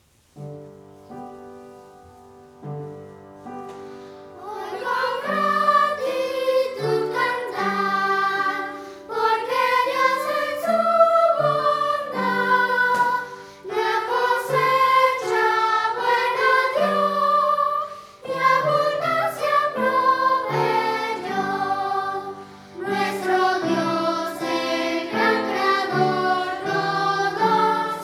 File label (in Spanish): Hoy con gratitud cantad Coro de Niños